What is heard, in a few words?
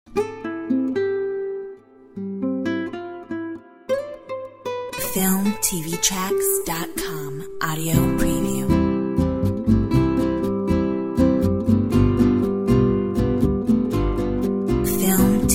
Mexican cafe